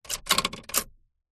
office typewriter single key press light jammed stuck typebar rear close vintage; 1960 lemai